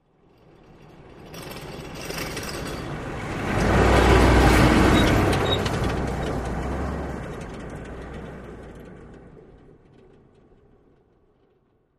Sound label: Humvee Med By Rattles